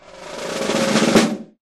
Roulement tambour; Roulement de tambours pour le cirque; Bruitages

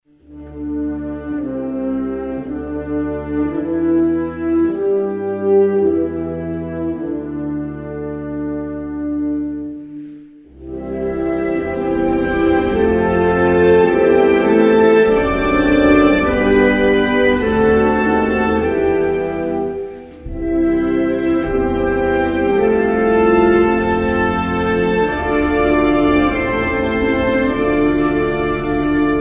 Japon, Hymnes nationaux

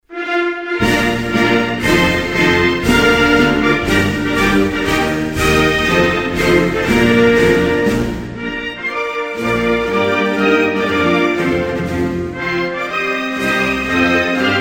France, Hymnes nationaux

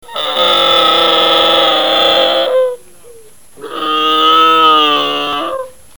Dromadaire

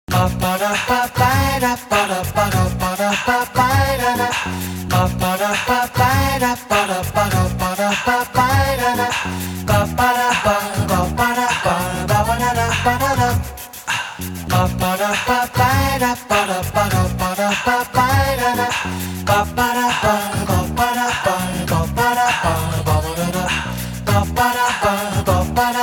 Emission jingle; Jingles medley

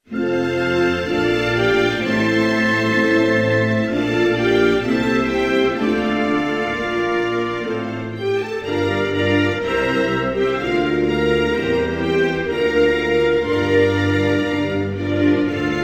Cambodge, Hymnes nationaux

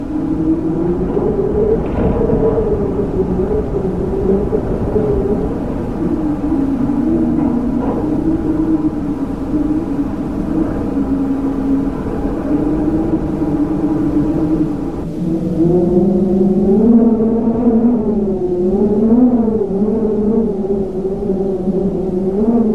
Hurlements sinistres et craquements, Vents

